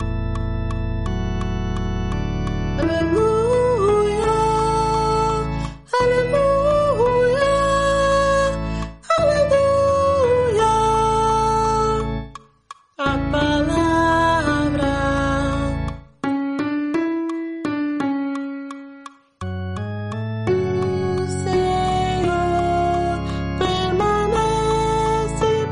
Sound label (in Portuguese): guia soprano; Aleluia Cardita; Aleluia Angelo Cardita